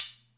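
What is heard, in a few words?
Atlantex Music Percussion Computer hi-hat closed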